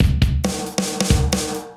CB LOOP; Zero-G; Chemical Beats

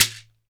SHEKERE, Synclavier Percussion; World & Orchestral; ILIO

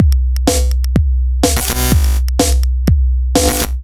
Drum Loops, 125 BPM

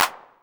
909 CLAP, TR-909; Best Service Dance Mega House Dance

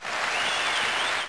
Applause, Yamaha DD-7

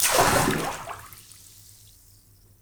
The Bucket, cartoon Foley Fx; musicradar; cartoon caper

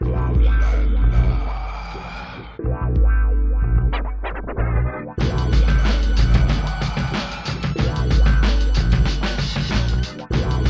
93BPM, hip hop; InVision Interactive; 40 Oz Phat Slammin

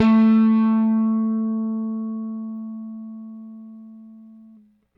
RickThumb-C, RickenbackerBass

